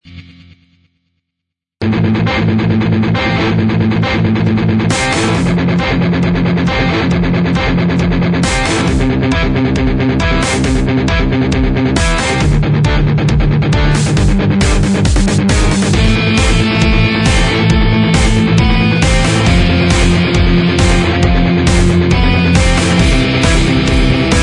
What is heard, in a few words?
bass